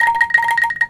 A 4 Bandung Angklung Vib

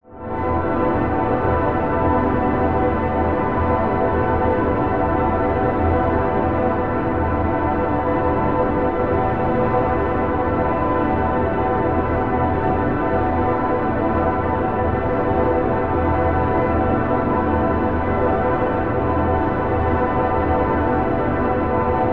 Perti verb Drone Texture

